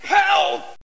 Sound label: discover civilian